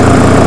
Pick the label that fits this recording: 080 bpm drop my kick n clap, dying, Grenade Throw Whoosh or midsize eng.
midsize eng